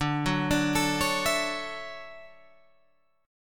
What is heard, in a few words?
D-Major 9th D down Guitar Open G